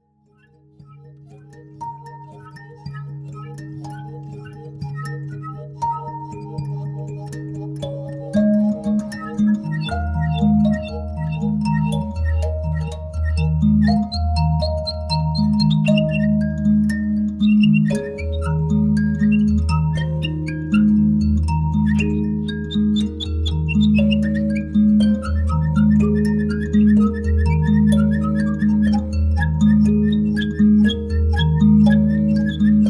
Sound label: array mbira and nail violin; 12-edo